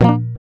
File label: GUI A#, GUIT AC; Best Service Gigapack 2